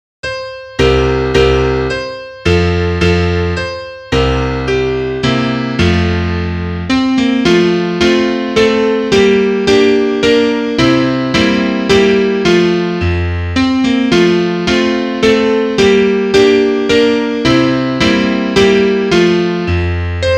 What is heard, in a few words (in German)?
Klavier; im maerzen der bauer